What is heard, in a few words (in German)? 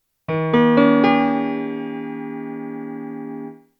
Harmonie